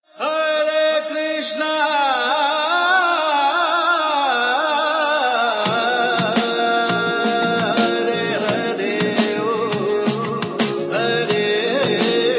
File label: Nokia hare krishna, ringtones